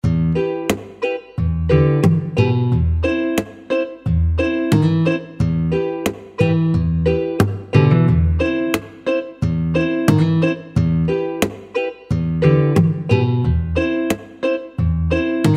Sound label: GUITAR SPLEEN